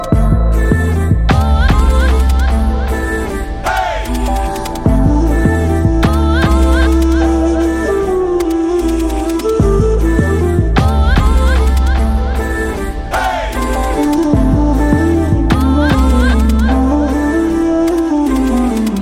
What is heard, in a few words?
Humming